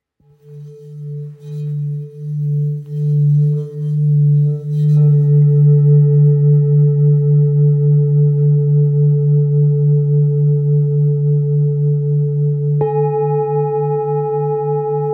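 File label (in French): Bol grave re fleur de vie, bol chantant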